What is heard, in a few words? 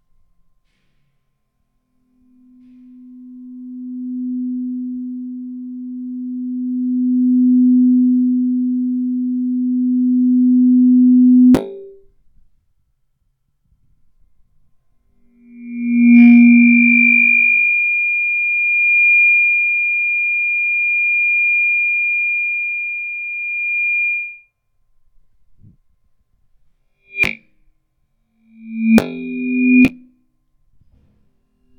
Microphone Feedback